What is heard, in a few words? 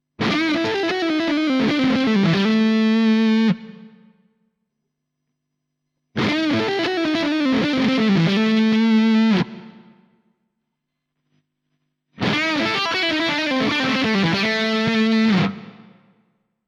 Lead HB1 split Humbucker, pickup; panico pickup hb1 humbucker